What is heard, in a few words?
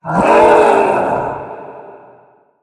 monster; MonsterVoiceお手軽パック